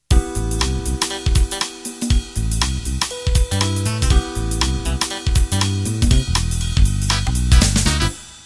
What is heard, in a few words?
Jazz Funk Intro